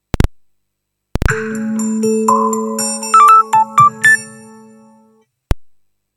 gigaset gs160 startup

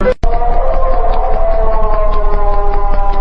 tap tap tap tap

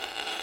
stone scratch

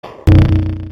stand appear; shadow dio

